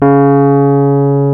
PNO, Rhodes Mk.V; Keyboards of The 60's and 70's

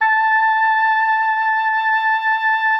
Oboe, A5; Xsample VOL-6

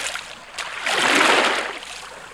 ROW, Boat Rowing

